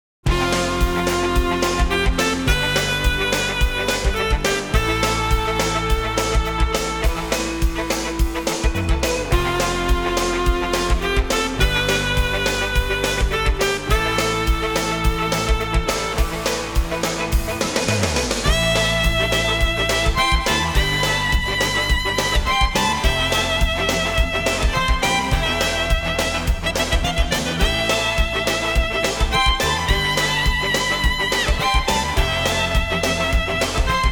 Boss Scene (Arranged Version); PC Engines; World of Darius

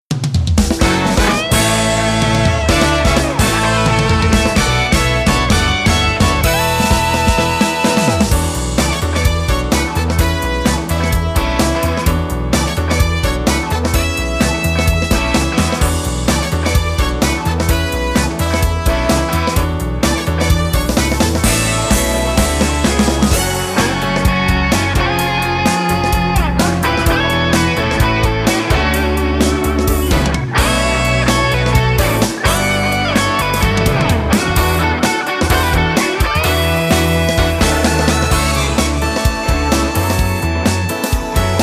Music Menu event

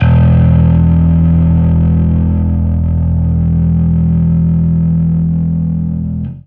Fuzz E1; torchlight iii; ps4

